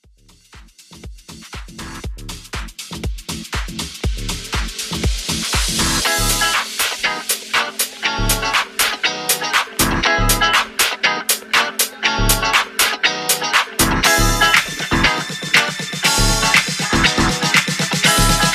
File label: Deep House 120 Bpm; Top40